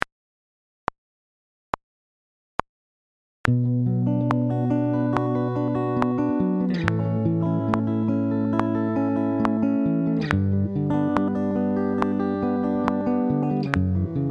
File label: Fingerpicking Technique